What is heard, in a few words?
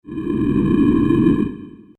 Cave Door Open, stone door; fantasy sound effect